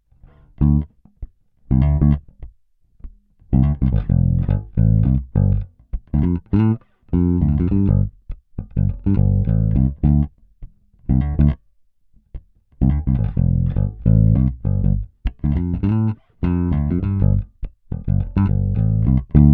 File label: Peavey Stock Bridge Fingerstyle Tone UP; Peavey based pickup Shootout